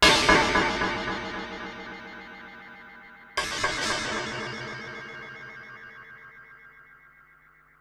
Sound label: Ring Mod & Delay, contact mic Cymbal